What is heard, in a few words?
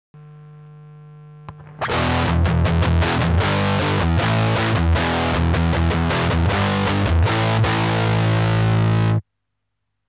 mesa marsh, speakersim